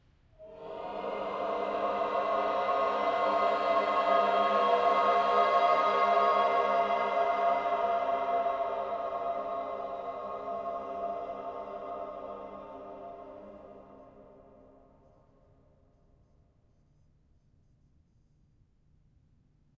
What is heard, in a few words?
Choir; Outlast 2; PS4 Windows Xbox One